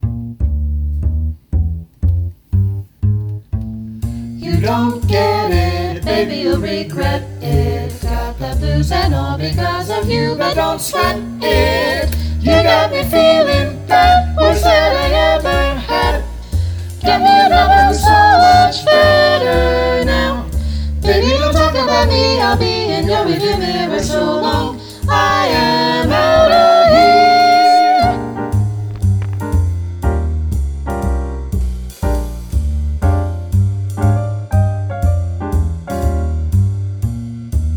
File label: Bb Vocal Jazz Etude no soprano; jazz all state